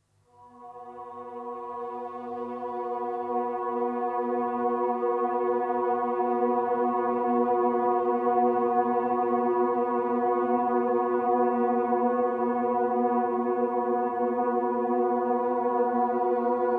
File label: Mysterious Drone; Nordic Nights Drones